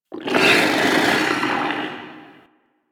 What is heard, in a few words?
interior moan gurgle; Ambience; Misc Indoor; DE; Warframe; Infested Eris